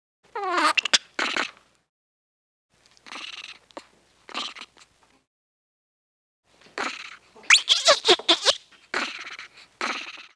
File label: Monkey Idle